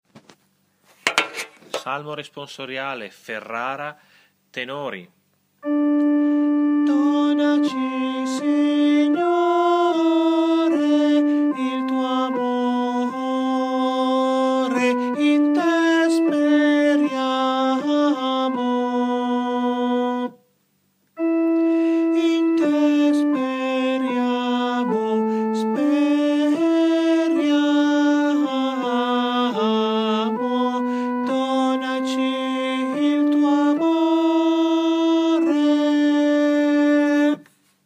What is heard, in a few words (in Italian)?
TENORI